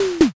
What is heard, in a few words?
door close